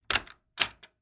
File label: Household Old Door Large Bolt Lock Unlocked Open Metal Handle Released; Stiff Forced; Creaky Door Sound Effects